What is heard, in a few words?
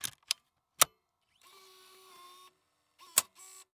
Technology Electronic Camcorder Panasonic Dvx100 Placing Tape In Tape Deck And Closing